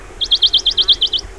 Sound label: Phylloscopus bonelli